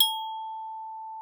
VIBES, A5; Xsample VOL-4

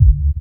bass drum deep, techno trance drums

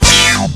hit, brass

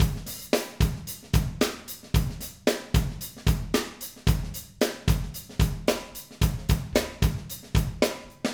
Acoustic Live Drum; Platinum Audiolab

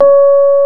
PNO, Rhodes Mk.V; Keyboards of The 60's and 70's

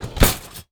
Draw, household rhythm samples Hits

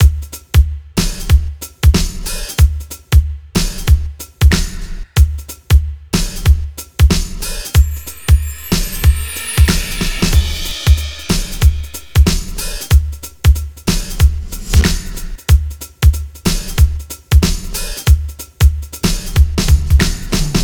Drums, 93bpm; Zero-G - Soundclash Nu Dance Electro; Acidized Wavs; Construction Kits; Smooth Circuitry

